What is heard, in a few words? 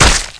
spit hit